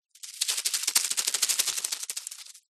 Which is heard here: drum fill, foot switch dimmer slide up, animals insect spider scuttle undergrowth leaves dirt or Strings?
animals insect spider scuttle undergrowth leaves dirt